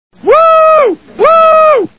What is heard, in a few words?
whoo; homer; Simpsons